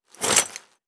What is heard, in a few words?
warrior walk foley; Aragami